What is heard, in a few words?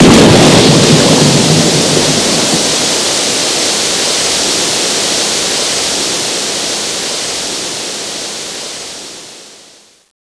water leaking